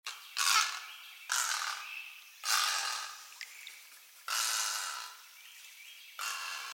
great egret; emiquon virtual tour; birdwatching; illinois

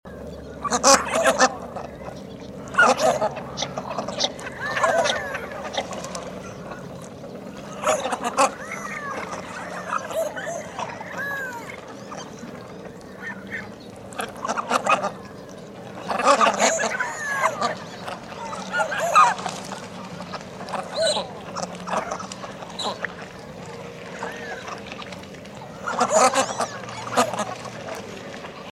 canvasback, birdwatching; emiquon virtual tour; illinois

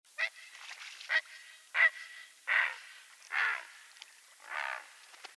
great blue heron; emiquon virtual tour; birdwatching; illinois

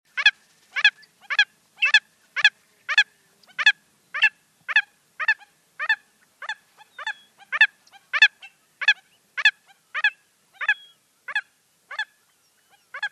willet, birdwatching; emiquon virtual tour; illinois

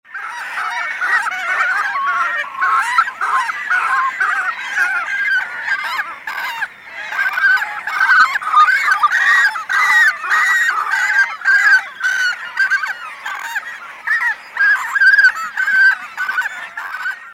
cormorant; emiquon virtual tour; birdwatching; illinois

